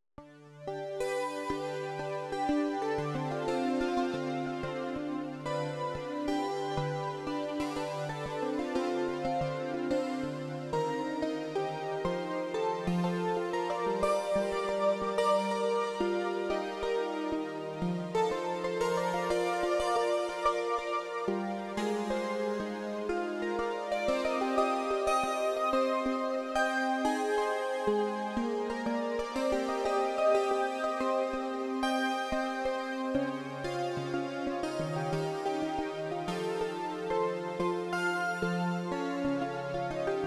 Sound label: pop arp